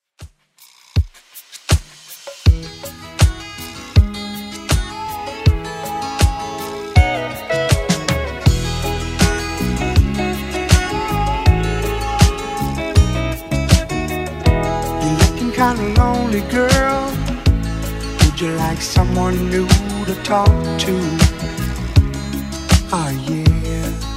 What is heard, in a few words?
70s Redrum 80bpm